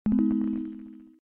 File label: open widget